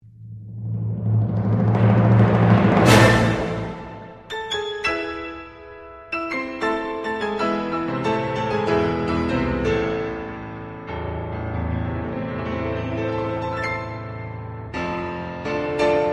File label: piano concerto in a minor - allegro molto moderato; Grieg